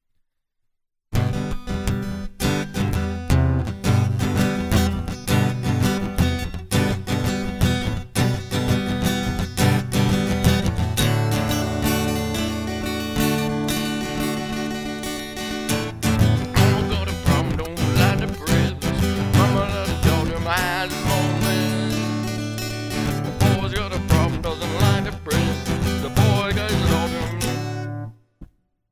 Guitar Loop

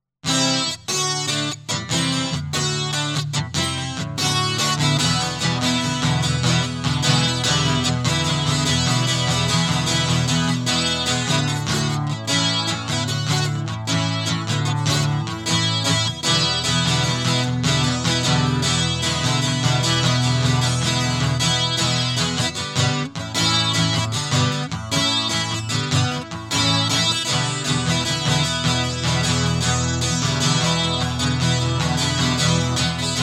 Guitar; hyper terrestrial

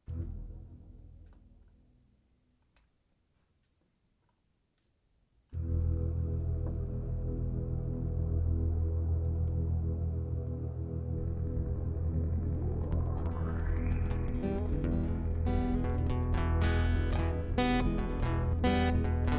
Blues

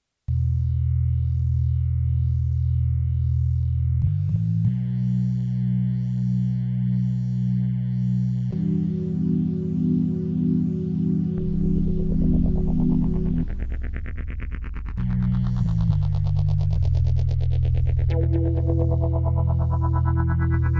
Ambient Ambience Prelude

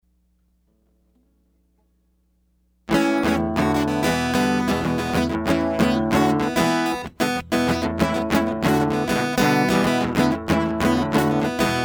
Guitar

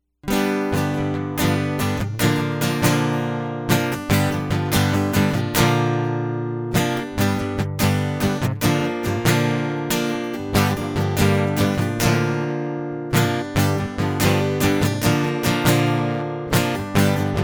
Guitar Loop

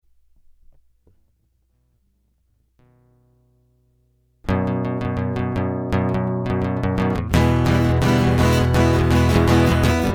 Instrumental

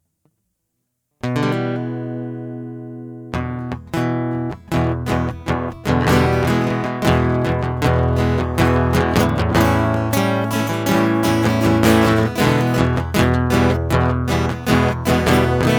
U Fn O Instrumental

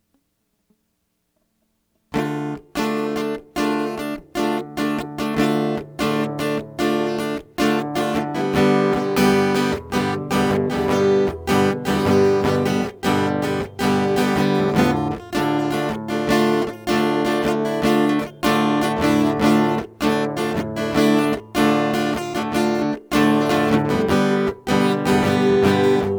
Satellite Guitar; hyper terrestrial; Beyond Babble On

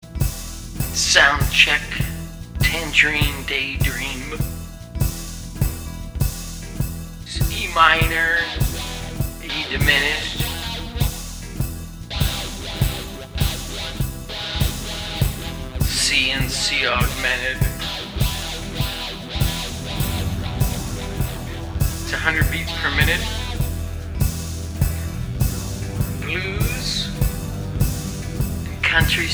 Soundcheck

